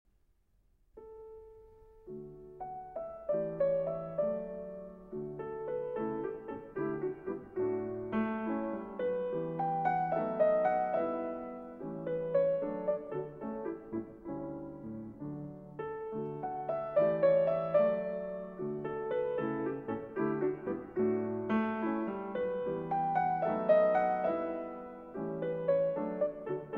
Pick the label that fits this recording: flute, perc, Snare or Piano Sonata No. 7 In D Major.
Piano Sonata No. 7 In D Major